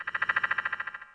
FROG

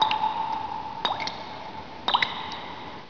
DRIP LOOP; Reqdemo

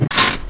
Reverse; CD Action; tdor

